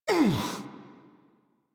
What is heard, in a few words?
Pain, Adult; Warframe; New War; Adult Operator